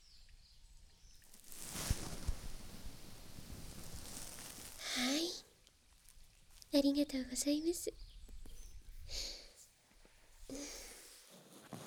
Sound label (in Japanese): 左耳かき; ノスタルジ-耳かき; 道草屋-芹 おもいで; 二人で怪談; お昼